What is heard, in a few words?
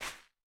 Step Sand; MiSide Demo